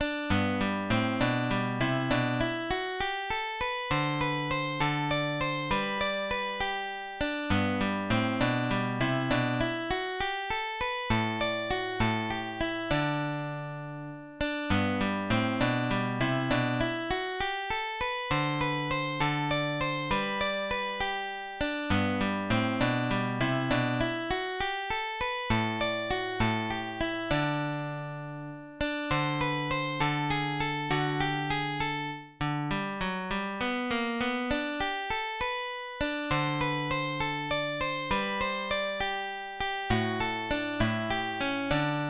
allegretto, guitar; diverse posizioni

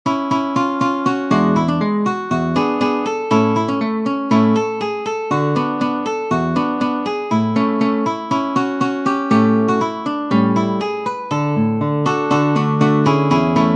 Polka, guitar

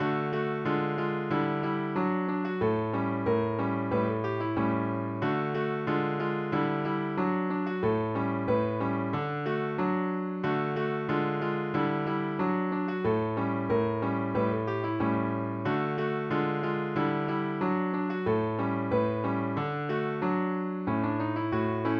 guitar polka; allandale

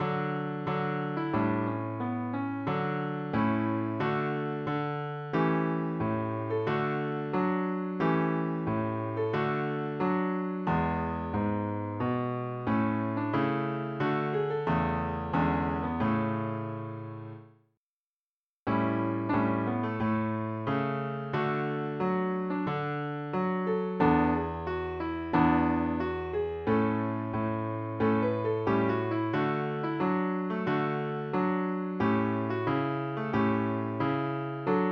guitar andante; don giovanni

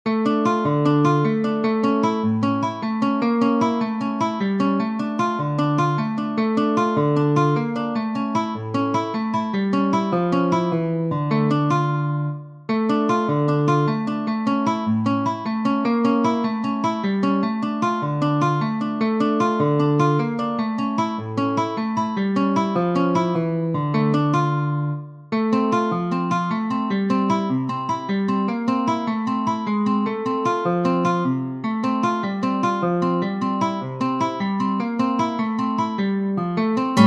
rumba; kopfstand

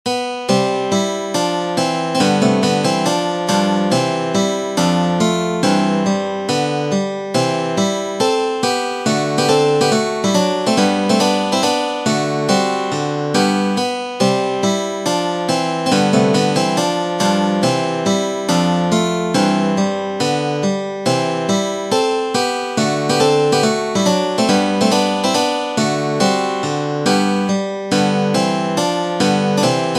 Etude Andante, guitar; Arling Shaeffer

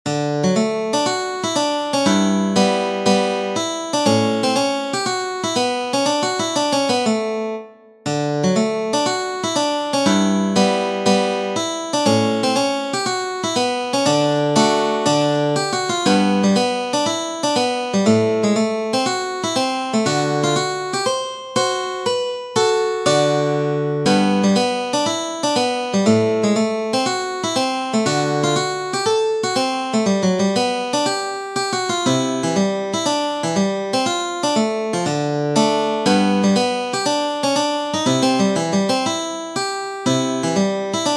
Schottische, guitar; Blushing Rose Schottische

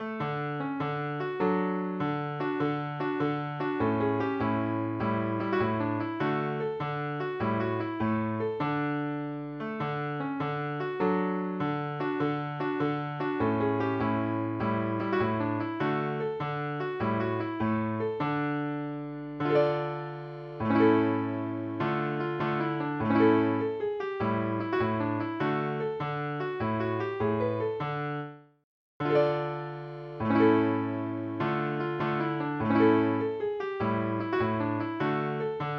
guitar; air from fra diavolo; auber

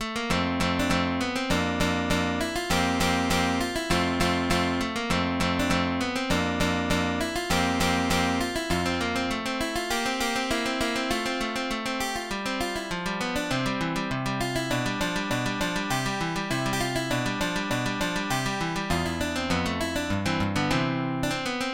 allegro spiritoso, guitar

